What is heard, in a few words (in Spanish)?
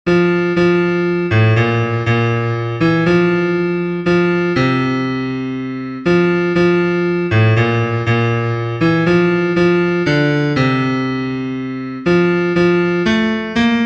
BAJO, himnos en piano; cantos del camino